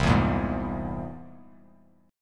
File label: C Augmented 7th, Bass Standard, chord; strummed; 8,7,8,1; JGuitar